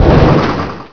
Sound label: CAN HIT; Pirates! Gold